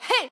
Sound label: Swipe; Jackie; Going Under